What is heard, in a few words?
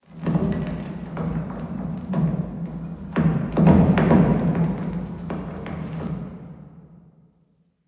amb lowpass ping, ambient; Half Life Model Pack